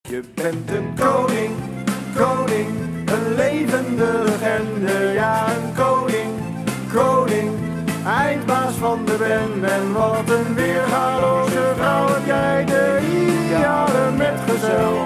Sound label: Ringtone